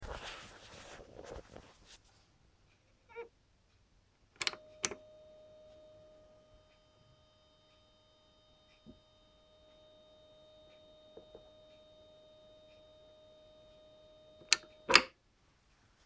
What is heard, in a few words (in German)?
Stoergeraeusch Korg